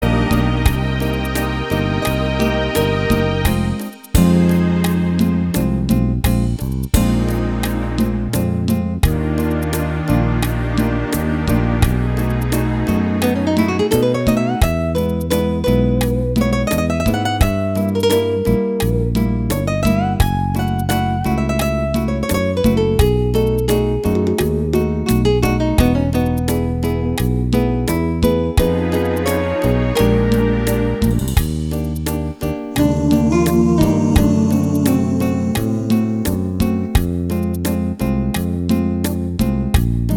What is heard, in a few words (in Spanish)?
bachata